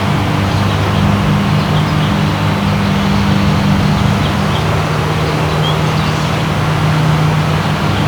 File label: amb CAR; Scenery; Decors